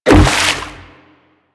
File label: Aqua Board Land; Warframe; Yareli